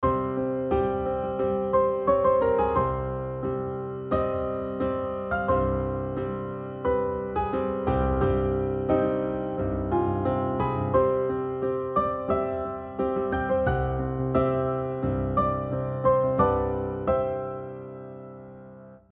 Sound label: modal mixture